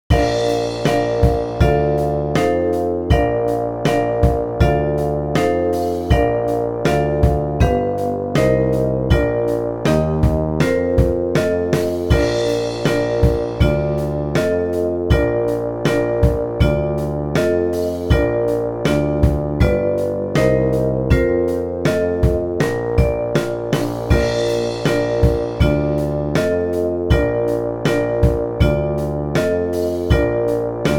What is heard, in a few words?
Bb Valves Only